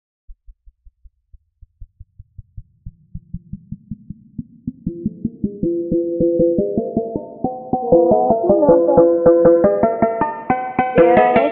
phonk remix

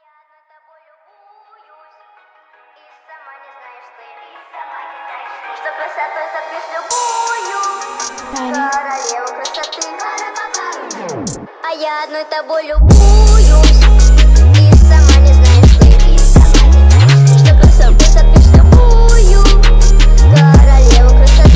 phonk remix

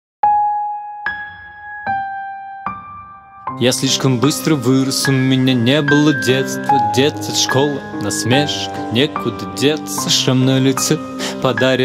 piano

